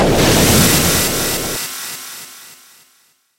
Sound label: fireball; metal sonic